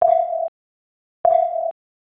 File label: loading; HTC; Cingular-8525-WM6